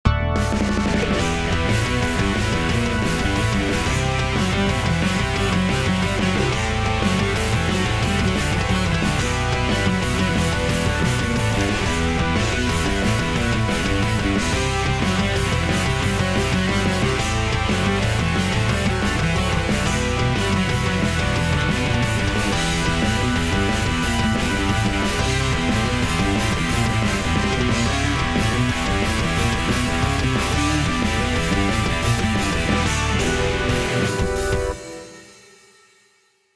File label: Riff